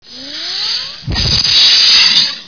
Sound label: energy pit north; ambience